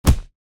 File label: body hit small